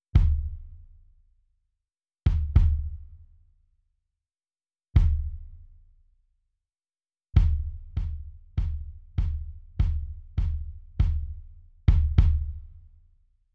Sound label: Kick No Trans Proc